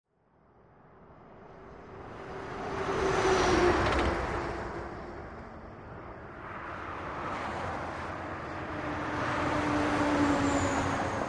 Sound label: Traffic On Quiet High; highway